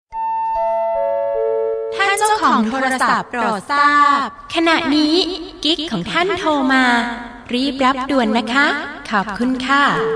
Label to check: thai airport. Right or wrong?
Right